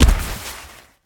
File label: Side Kick; MiSide